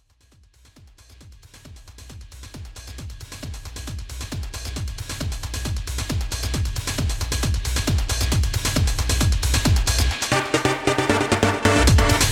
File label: chord